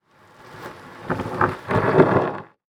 Foley Movement Wood Slide Through Dirt Wood Clanks Together